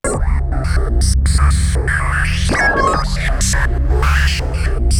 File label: ATMOS; East West; Global Sounds